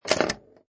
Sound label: Pinball Sound Ball Hit Metal Object And Move